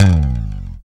BS, E.Bass FX

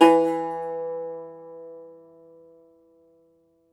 cumbus saz E, plucked strings

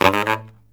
Killer Brass Riffs

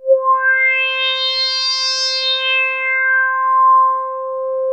TS10 Analog, Synthesizers

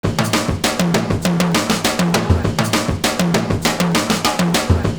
TOMS N SNR; Univers Sons - Kitch 70s

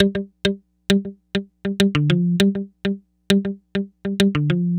Acoustic Guitar; Sonic Foundry (Sony Creative Software); Mac Money; Guitars

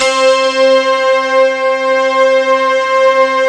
SPIKE WAVE, PPG WAVE; Rubber Chicken Software Sample Bank

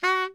BARI FF F#3, saxophones; Greytsounds - Philip Wolfe David Crozier's Saxophones; BARI SHORTS

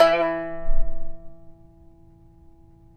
tar; Roland - LA Composer vol. 3

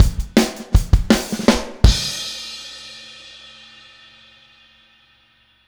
Outro Full Mix, Drums 162 BPM; Drums from the Big Room The Mixes; Take Your Toms Off

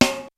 XR-10 K+SN+H, drums

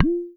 DRIP; Spectrasonics; Liquid Grooves; WAVDRM HITS1

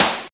SNR, Tekno Industrial; East West

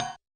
Kit Hat, Realistic Concertmate-670; One Shot Kit

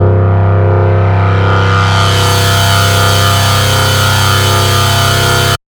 BASS 88 (C); neuro; Stanza

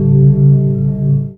DEEP; Best Service Dream Experience; SF+EFFECTS

